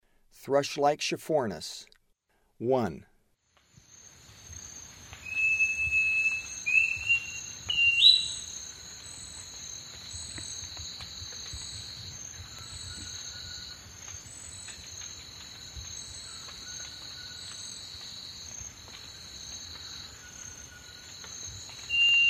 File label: Thrush-like Schiffornis; S. America; Eastern Lowlands